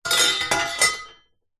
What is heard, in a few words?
impacts metal hollow pieces drop